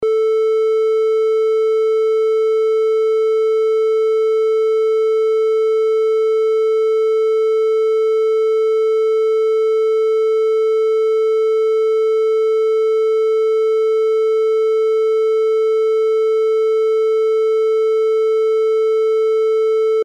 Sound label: wave triangle